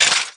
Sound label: nod; drebber